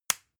spice jar small close

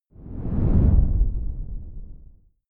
whoosh simple low grainy

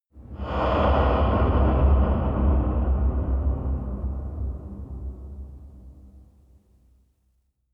choir hit dark, impacts; hits explosionen

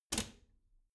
bathroom cabinet doors close soft; objekte; tueren

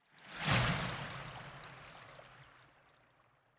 whoosh hard

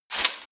grab rice bag; gastronomie; berufe

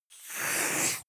reptile hissing

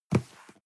cardboard box medium grab slide hard; objekte

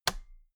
toolbox latches close regular

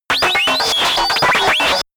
calculator high fast, roboter; comedy-cartoon; soundpool

